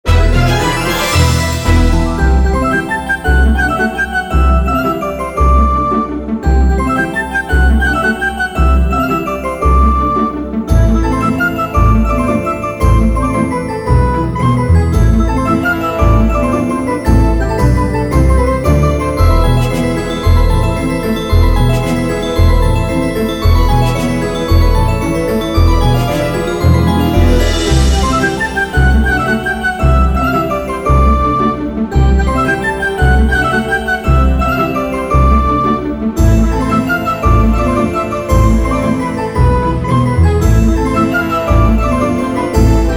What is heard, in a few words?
bonus game tune; Cash Fairies